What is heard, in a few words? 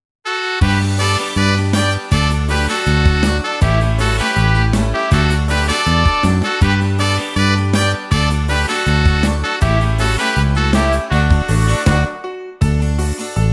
Karaoke